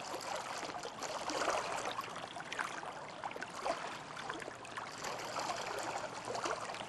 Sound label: amb dock water; angel city; Titanfall 2